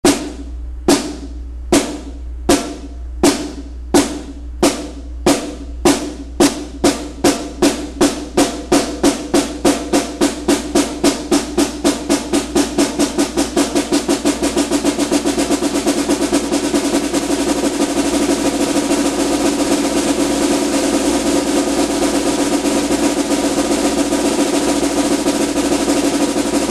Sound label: winter snare, drumline